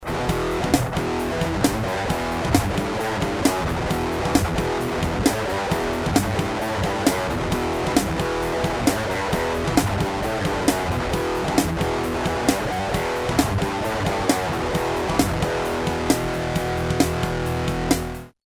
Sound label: zoom kombo, soft combo emulation; комбоэмулятор; гитара Shamray; корпус - ольха, датчик - Dimarzio Fast Track 2; гитара Shamray ($550)